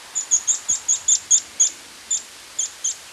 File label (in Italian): pispola